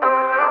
Vocals; Launchpad Mini MK2; Lightshow Pack Project; Processed

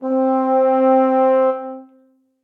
Long Senza Vibrato Brass Quartet; Ableton; Spitfire Audio; Individual

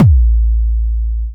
digital tonal, Kick; Ableton Foundation FreePacks

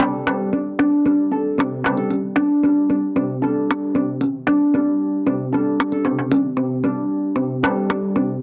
Cello Impacts - 114 bpm; MIDI Clips; Drums; Ableton; Mood Reel

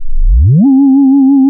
Thereminsk, Synth Lead; Bass; Ableton; Creative Extensions

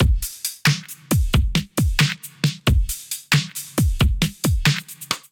Kit groove 90 bpm; MIDI Clips; Western Australia Kit groove; Drive and Glow; Ableton; Factory Packs

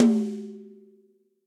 Tom High Cold Tight Room; Drum Hits; Standard Hits; Ableton; Factory Packs; Session Drums Studio

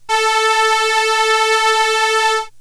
Solina Viola A3; Analog Synths; Ableton Foundation FreePacks

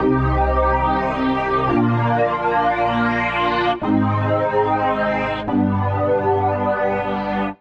Pad (Cm) 126bpm; Music Loops; Pandora; Ableton; Singularities

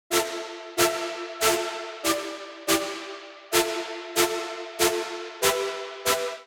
Strings A Minor 142 bpm; MIDI Clips; Tonal; Pads; Orchid; Skitter and Step; Ableton Factory Packs

